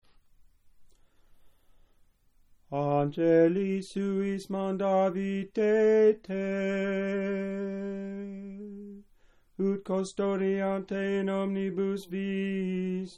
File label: Gradual Psalmtone, sacred chant; Lent 1; Angelis Suis